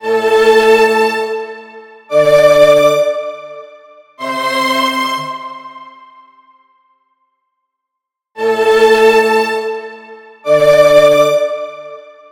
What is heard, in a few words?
Kung Fu Synth Sound